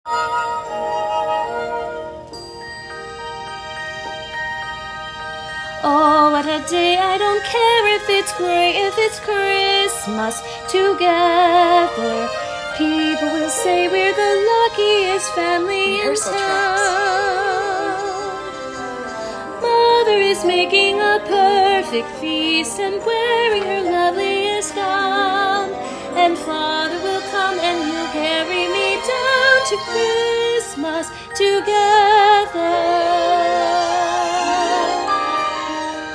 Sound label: christmas together with vocals